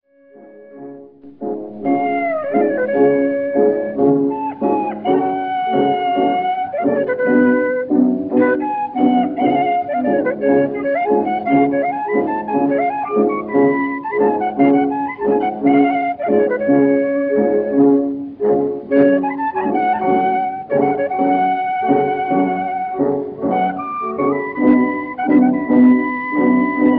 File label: klezmer